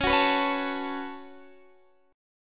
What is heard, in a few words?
C sharp 7th Suspended 4th Sharp 5th, Guitar, DADGAD; down; chord shape